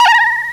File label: BOING HI, FAIRLIGHT CMI IIX